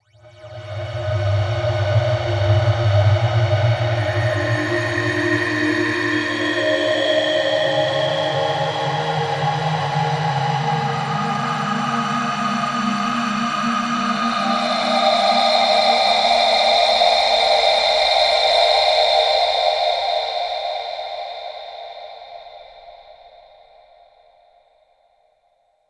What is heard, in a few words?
Night Keys; KJ Sawka; Drum n Bass & Beyond